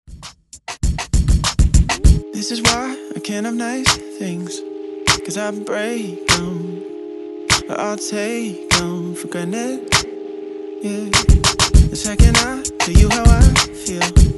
Top40 Redrum

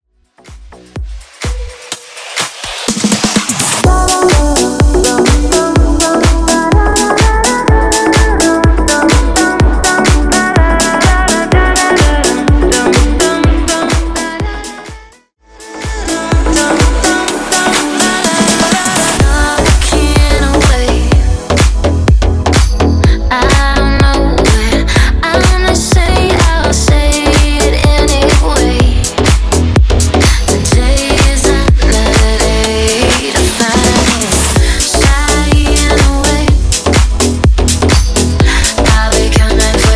125 BPM

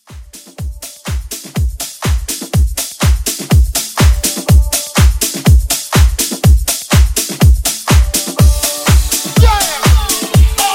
Party Break

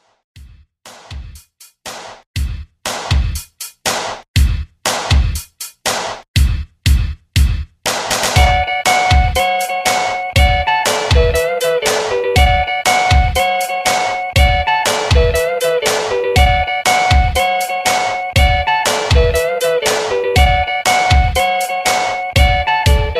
50s Christmas Redrum 120 Bpm Clean

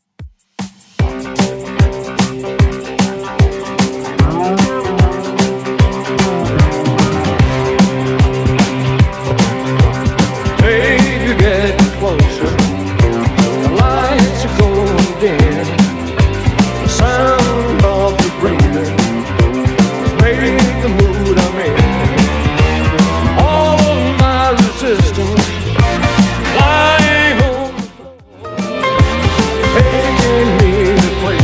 70s Redrum 150bpm

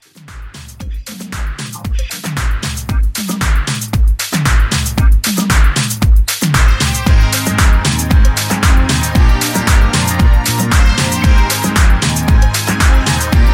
80s Pop Re-Drum 115 CLEAN

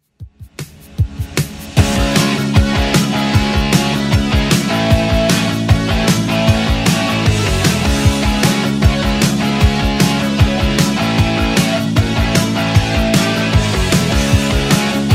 80s Rock Redrum 153bpm

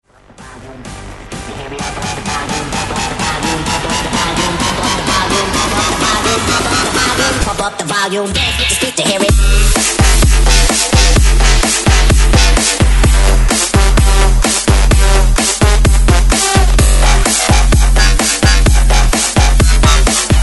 128 BREAKMIX; DJDOLLS

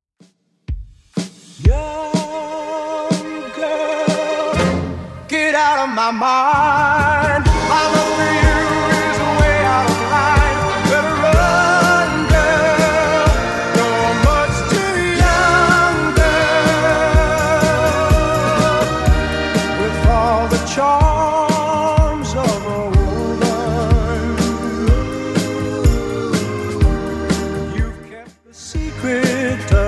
60s Redrum 124bpm

